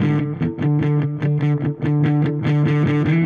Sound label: Dirty Chunk Guitar, Indie Rock